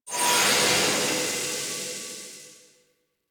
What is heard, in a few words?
Portal Rabbit Enter; Duviri